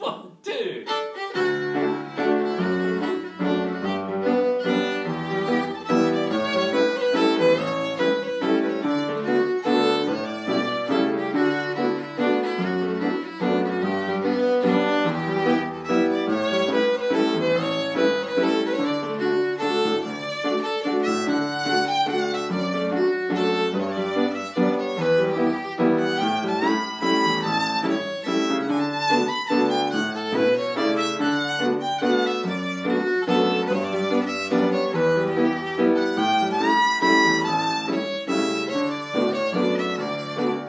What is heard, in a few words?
good neighbour waltz